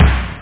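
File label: snare drum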